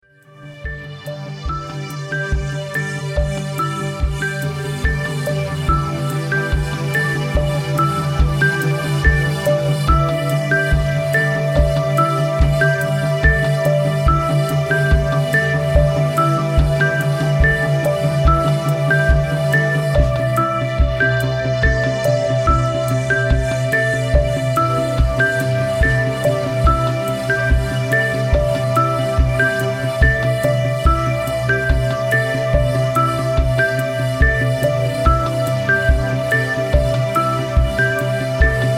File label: 143bpm